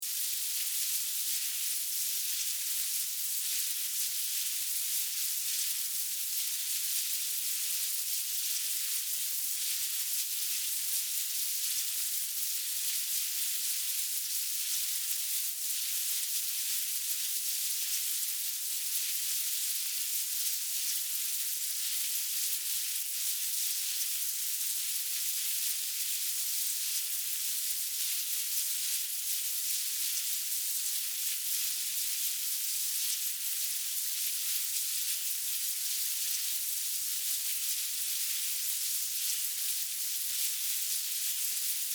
Hive Light Activity, Hive, Light, Activity, Bugs, Movement, Insects; Animals:Insects; Miscellaneous Insect; Blastwave